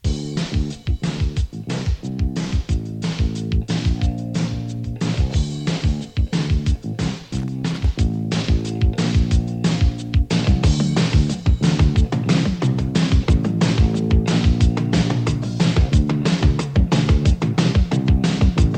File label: AKAI CS-M01 Test Recording